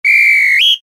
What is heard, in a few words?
Football message; Nokia; Audio themes